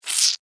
Insect Hiss Angry, Animal, Insect, Hiss, Angry, Bug; Insect Voice; Insects; BlastWave